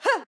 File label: Swipe Normal; Jackie